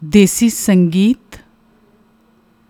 Desi sangeet, Music rooted in a region related to common masses; Technical Terms